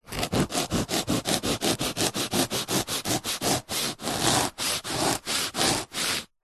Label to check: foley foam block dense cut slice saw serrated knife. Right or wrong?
Right